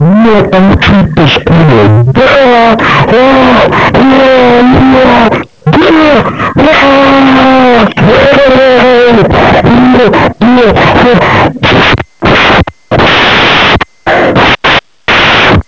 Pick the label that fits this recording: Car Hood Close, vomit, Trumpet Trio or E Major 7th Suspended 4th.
vomit